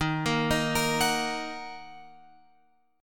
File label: D sharp Minor, Guitar, Open F; arpeggiated; JGuitar